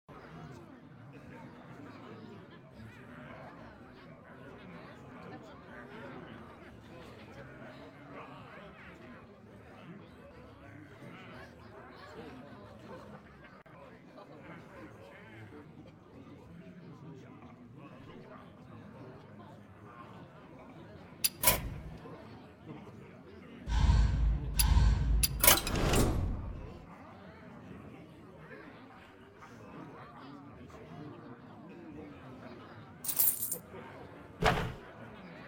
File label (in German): murmeln; hearthstone